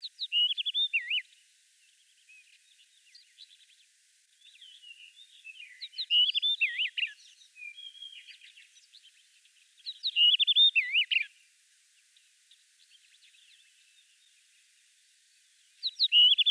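pestryy kamennyy drozd golos, rufous-tailed rock thrush voice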